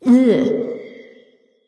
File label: tongue light; smite; voicepack; hel